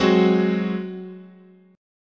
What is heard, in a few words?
F sharp Suspended 2nd Flat 5th, guitar chord